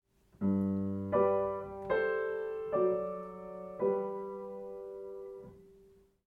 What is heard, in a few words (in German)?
synk bass quint